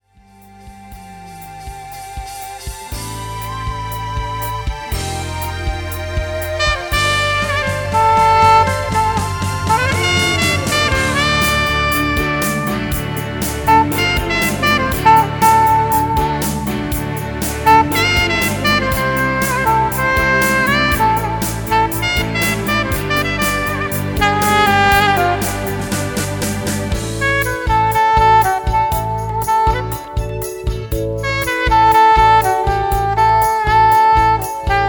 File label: Solo Sax